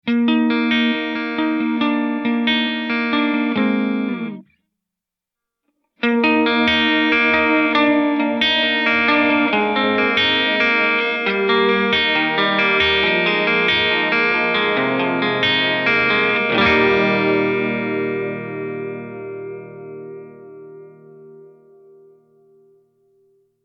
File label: LoFi Warble Tele, reverb